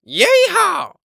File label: Human Male Yee-Haw